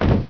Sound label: pl jump land; justislave